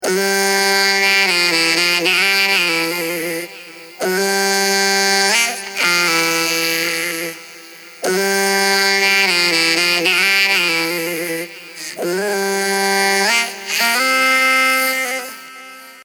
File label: Chicky Boom